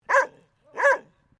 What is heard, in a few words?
Animal Dog Dalmatian Female Bark; Various domestic dogs; Dogs (Sound Effects:Animals)